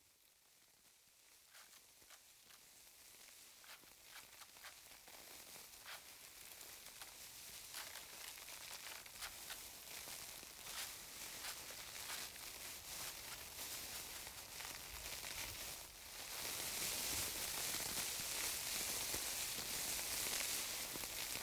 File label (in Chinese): 公寓楼道 花枯萎, 环境音